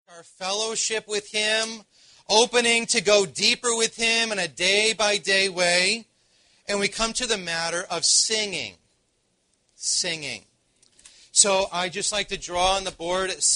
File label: Singing; Morning Session One